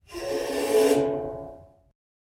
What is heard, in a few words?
Eerie Flutes; SoundsCrate